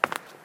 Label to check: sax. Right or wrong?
Wrong